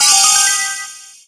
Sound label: Entrance; Sheik's fight entrance; Zelda